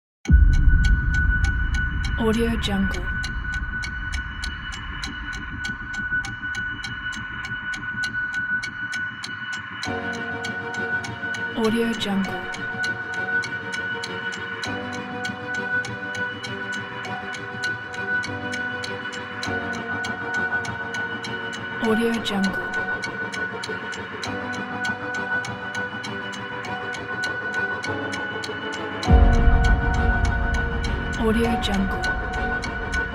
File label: suspense timelapse